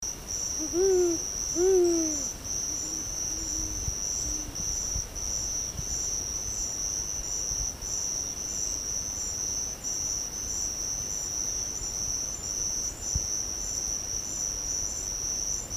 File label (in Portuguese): Som Jacurutu